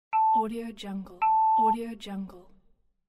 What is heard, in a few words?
censor beep